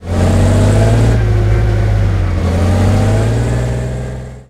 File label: chieftain engine load; engines; War Thunder